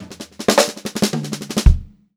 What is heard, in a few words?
FILL, Funk-Groove Drumloops; USB Soundscan vol.38; AKAI; 90 s Sample CDs